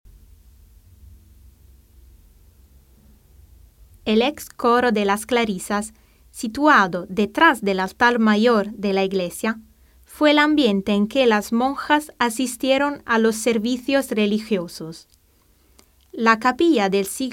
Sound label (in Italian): coro